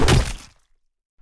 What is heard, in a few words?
2x4 Hit Gore; Scrap Weapons; Zomboid